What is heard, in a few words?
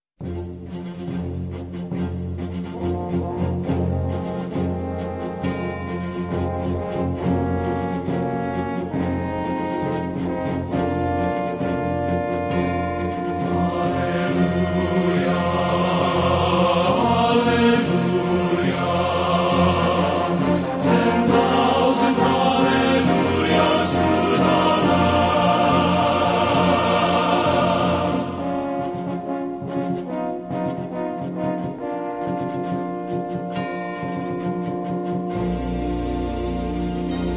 men's chorus